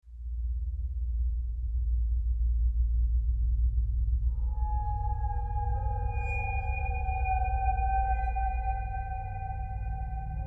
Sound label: mistery ominous atmosphere